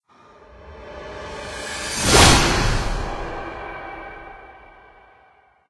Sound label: Stinger Nurse Appear; silent hill homecoming 2008 gamerip pc ps3 x360; Ambient